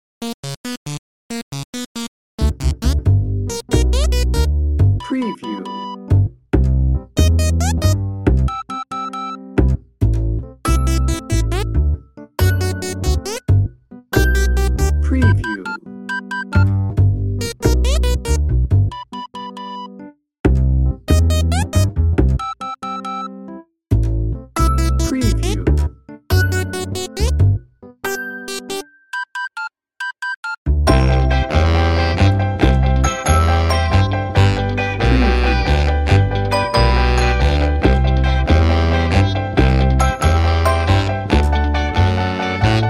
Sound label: boom boom no drums